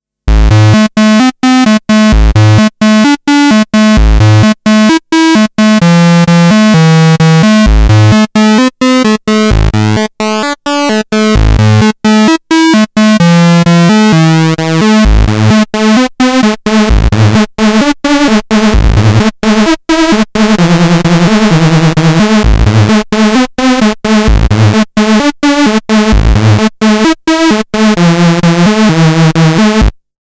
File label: PWM, Roland SH-09